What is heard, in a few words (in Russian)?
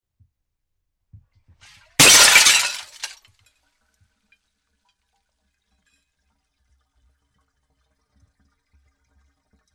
butylku s vodoj